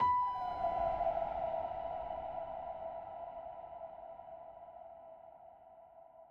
Ambient Hit E

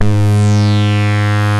REZ SYNC G1, Vintage Instrmt

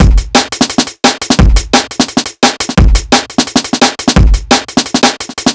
E Beat, Electronic Beats

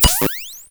Springy Snare; Cwejman S1; DevSnd Cwejman S1 - JK

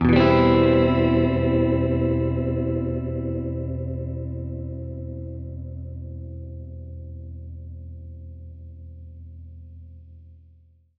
Gretsch Chord Eminb6, Guitar Chords; undercover samples

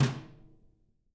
BRUSHES, Living Drums

